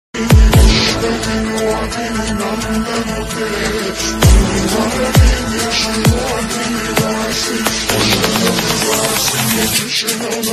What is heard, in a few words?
aigiri nandini dj remix ringtone; durga puja special